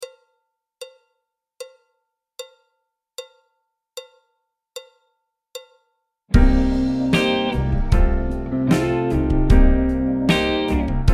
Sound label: Grey Day Blues BT (clarinet, flute, tenor, trumpet); Jazz Syllabus Level 1